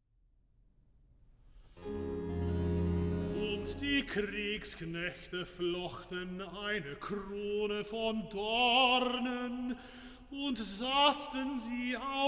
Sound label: Recitative; Bach, J S; Johannes-Passion, BWV 245, Pt. 2; XIII. Recitative. Und die Kriegsknechte flochten